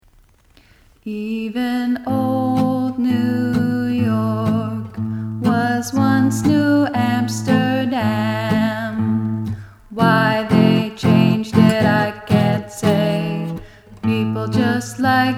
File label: chorus harmony